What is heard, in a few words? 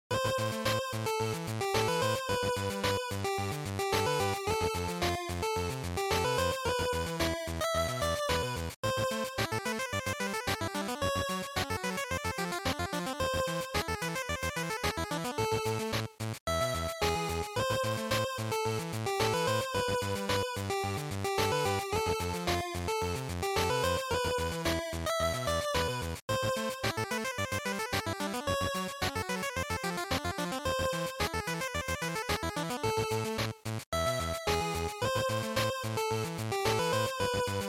GB Racing Game Over